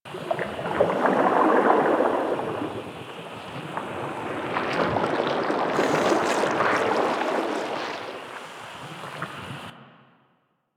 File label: Toxic Coolant Loop; ambience; props; loops; Warframe; Venus Open World; Venus Landscape